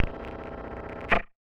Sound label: Casio, Glitched, malfunctioning synth